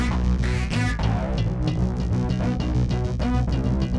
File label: bass; musdb18